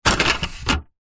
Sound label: office printer paper tray plastic pull out